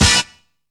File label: X HIT; Zero-G; Funky Elements